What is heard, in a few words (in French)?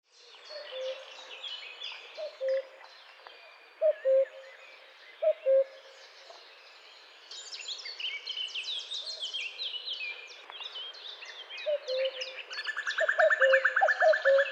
Coucou gris